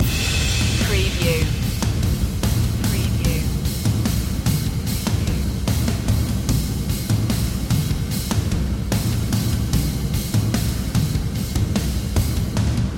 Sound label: gothic trailer hero loop